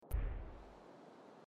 between choices sound; Life is Strange 2; gamesoundopedia

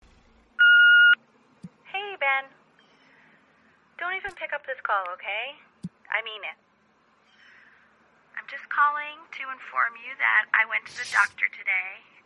call; before your eyes

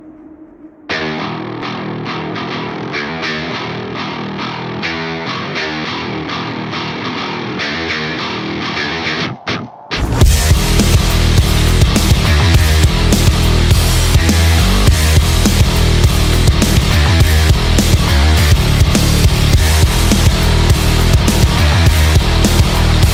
music; Doom Eternal

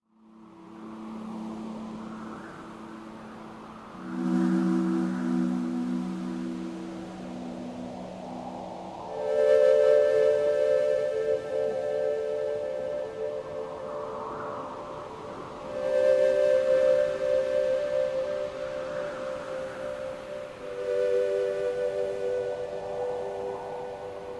soundtrack ambience; hyper light drifter; north

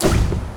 dash; hollow knight

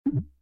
invalid action; sims 4

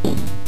sound enemy explosion; kung-fu master

